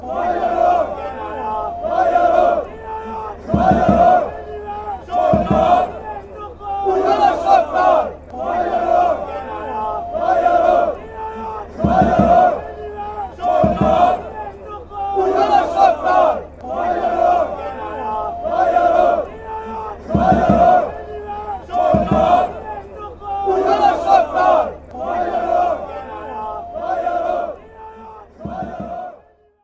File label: shouting, hungary